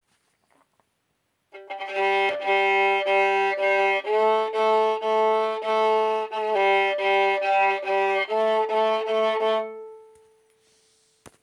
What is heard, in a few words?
G string